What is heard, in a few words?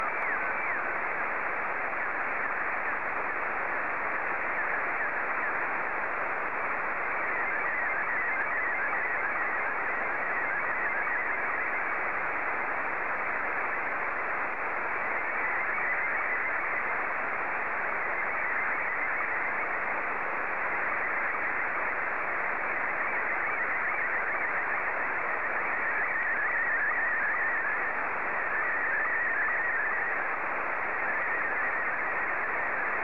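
SSTV, Scottie 2; VK4MSL SSTV RX @ QG62ln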